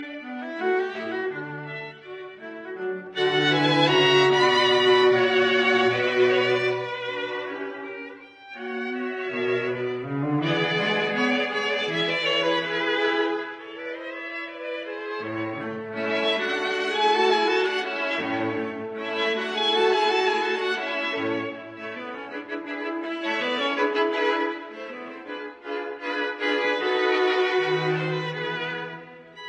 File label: Mozart String Quartet in D major, Allegretto; K 499; Hoffmeister